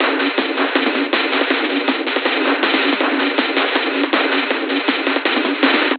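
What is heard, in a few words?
Master Loop, beats; Sonic Foundry (Sony Creative Software); Esoterik Beatz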